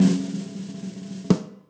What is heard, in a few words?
drumroll